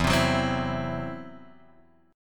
E Suspended 2nd Sharp 5th, Guitar, Drop D

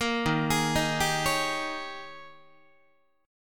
D sharp 11th D sharp, Guitar; D#11 arpeggiated

